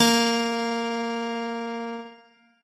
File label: piano; pianojs